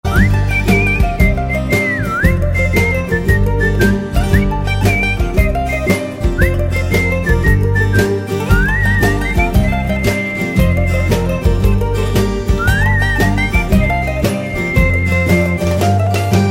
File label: Funny Whistle Tone